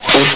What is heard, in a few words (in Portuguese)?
fumacinha; 7invasores